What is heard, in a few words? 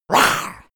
beast growl high, comedy cartoon; soundpool